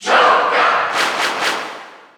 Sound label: Cheer German; Joker; SSBU